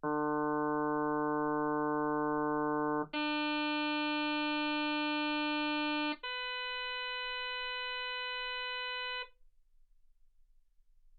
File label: harm sinus 48kHz